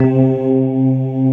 SYN K-2000, Roland

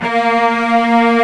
ARCO CELLI; E-MU Formula 4000 Series Vol. 5; Protozoa; Proteus2 Instrmt

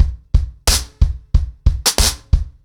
90BPM, ACOUSTIC DRUMS

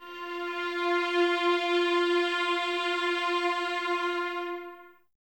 F 4, 11 Violins, Soft; Miroslav Vitous

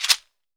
CHEKERE; AKAI S-Series CD-ROM Sound Library VOL-5

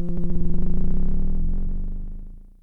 FX, Dual-Percussion-Synthesiser; Klone; Drum Machines

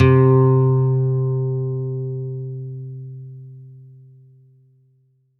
C2, CLUB ORGAN; Roland JV 2080

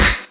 SD, dance drums hip

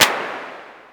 Clap-Reverb, Vermona DRM1-MK2

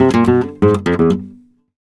gta e, Flamenco, Guitar, Picado

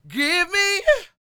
SING, Vocal Planet Blues Soul BLUESY

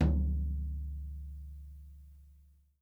FLOOR; Sampleheads; New York City Drumworks VOL-1

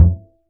D STRING UPRIGHT BASS, Upright Acoustic Bass

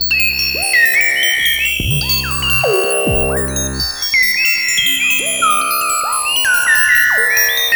COMPUTERS, COMPUTACHATA; Zero-G Ambient 1

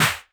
Elektron Monomachine, Drum Machines

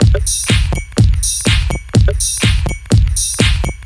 124bpm, House Drumloops; Zero-G House Fabrik Drumloops

